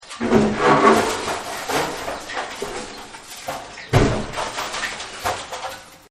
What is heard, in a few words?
Crumbly Destruction; Smashes; Objects